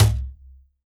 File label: WORLD DRUMS MIXED DRUMS